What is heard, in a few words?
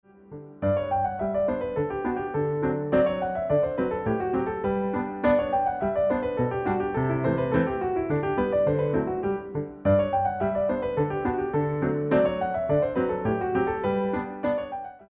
Jete fast, music for ballet class